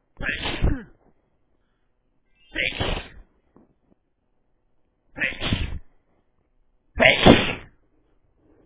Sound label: 4 sneezes, male